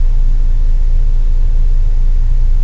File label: Rumb Vent; Star Wars Knights Of The Old Republic II; XBOX